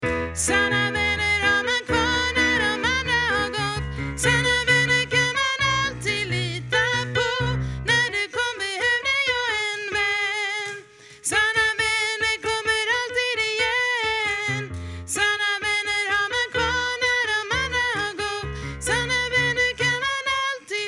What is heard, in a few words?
tenor